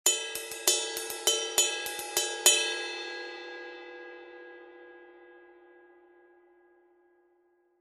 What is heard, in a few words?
20in Custom Dry Ride, cymbal sound